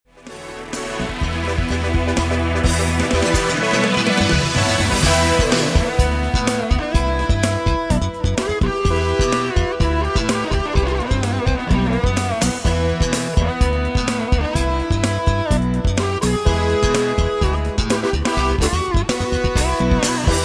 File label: evi melody